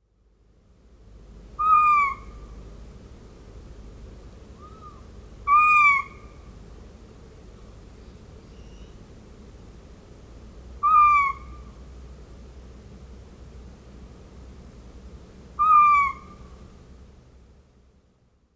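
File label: Japanese Scops Owl; sajata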